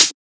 muffled hi hat g sharp key, hi hat closed

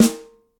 reverb acoustic snare sound a sharp key

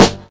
boomy snare g key; snare drum samples; drum single hits one shots

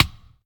snare F sharp key; single hits one shots

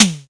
smooth acoustic snare G sharp key; snare drum

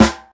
original steel snare drum d key

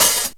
clean open hat one shot c key

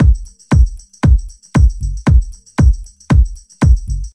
slow big techno kick loop; drum loops

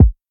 round kick drum a key; kick drum samples

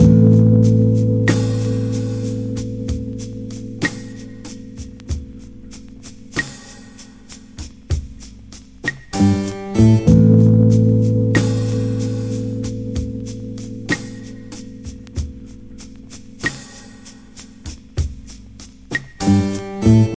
48 bpm breakbeat sample A key

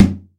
smooth tom drum one shot g key

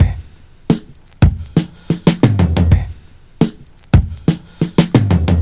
118 bpm breakbeat sample f key

